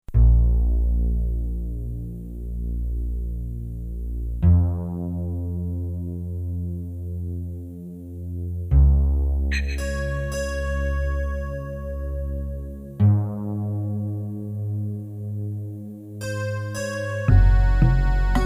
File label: Ambient P Desertland